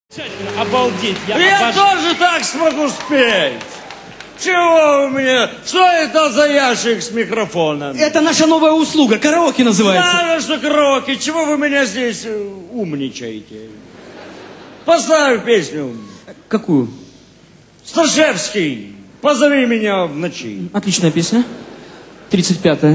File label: karaoke